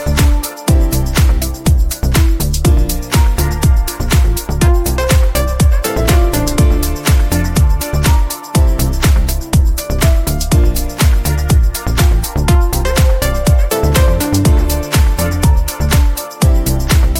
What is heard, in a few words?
Cool Samsung Music Ringtone